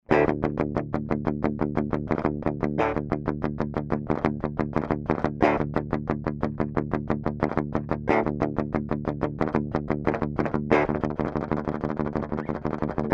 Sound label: Bare Knuckle Pickups PainKiller